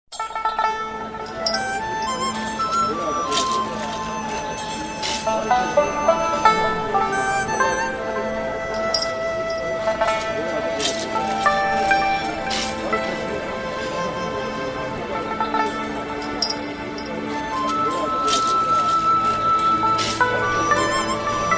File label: chilling n soft